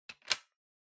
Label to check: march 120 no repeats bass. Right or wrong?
Wrong